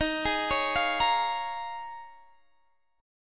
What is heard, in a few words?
D sharp 7th Suspended 2nd Suspended 4th Guitar Drop D